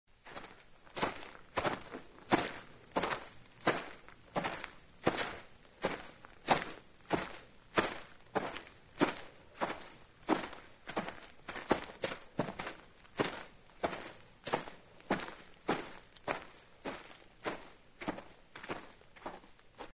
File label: Footsteps on the grass